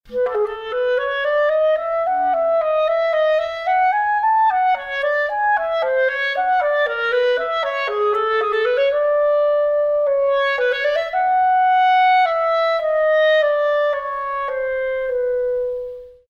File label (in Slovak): klarinet